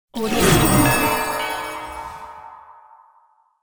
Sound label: quick holy bless spell